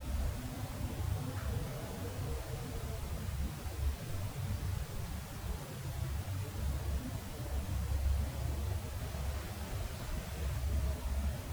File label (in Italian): probabile effetto cinetico rap con strusciamento vicino microfono